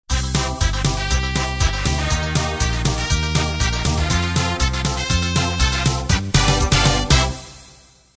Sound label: 2beat 60-70s end